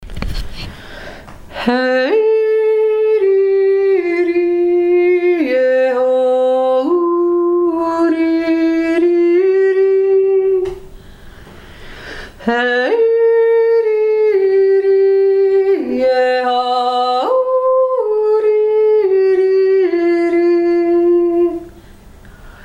sonntagsjodler

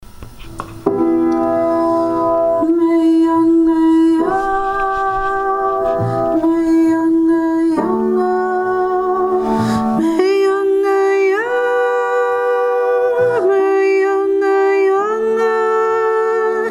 mejonghe obere stimme, archaische gesaenge; archaische gesaenge goldegg oktober 2023

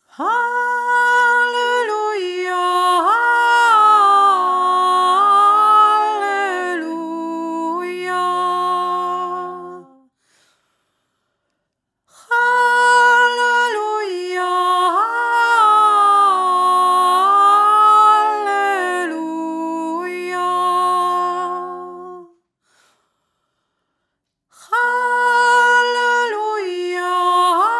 halleluja oberste stimme

